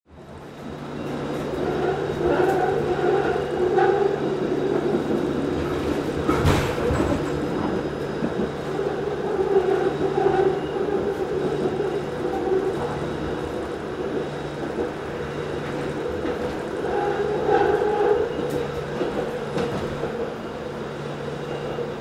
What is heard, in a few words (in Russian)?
zvuk tramvaja